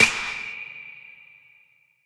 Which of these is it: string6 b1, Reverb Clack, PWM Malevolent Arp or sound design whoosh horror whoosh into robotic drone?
Reverb Clack